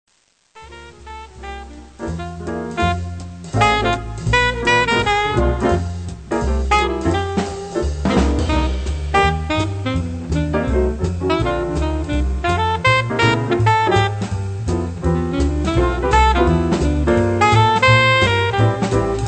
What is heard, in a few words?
alto; Jazz Conception for Alto & Baritone Saxophone